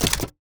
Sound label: ui switch buff